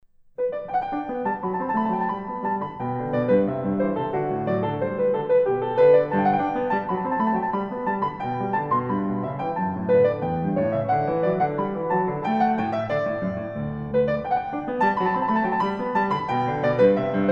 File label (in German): bourree g dur; franzosiche suite n5; bwv 816